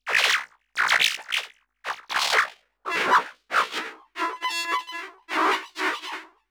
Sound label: Alien Chatter, sci-fi